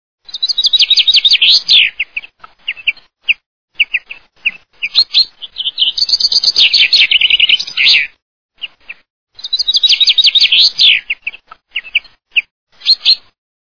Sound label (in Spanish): canto Pinzon Comun